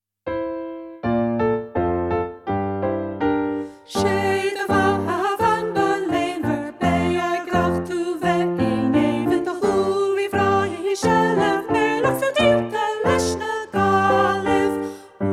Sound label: Instrumental; Oro Se Do Bheatha